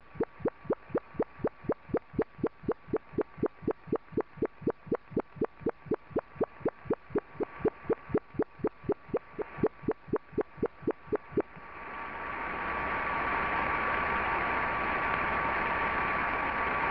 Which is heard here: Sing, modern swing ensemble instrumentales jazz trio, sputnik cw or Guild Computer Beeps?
sputnik cw